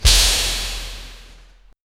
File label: cheerleading basket toss